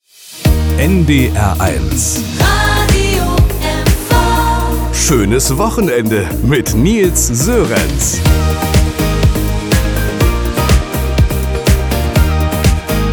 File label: Weekend Opener; NDR 1 Radio MV